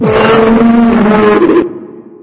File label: dog alarmed, npc; Source Engine; video game